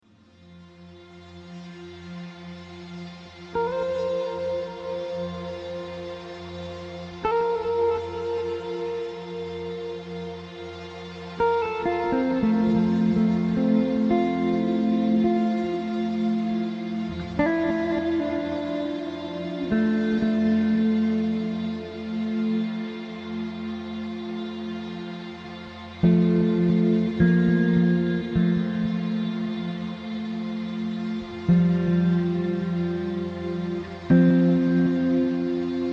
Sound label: Calm Music For A Relaxing Massage